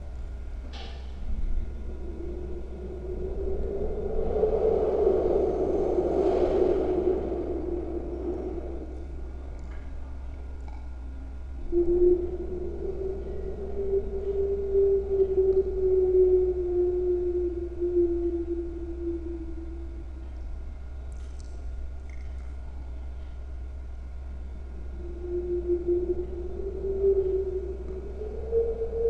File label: birds